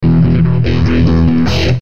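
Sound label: Musical Element, Drum Snare March; Blastwave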